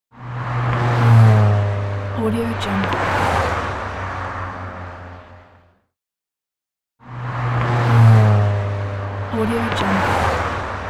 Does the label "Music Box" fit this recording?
No